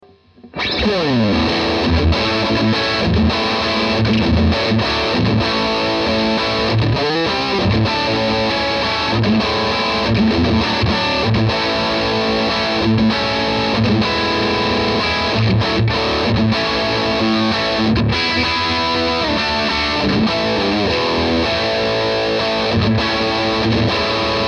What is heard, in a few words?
High Gain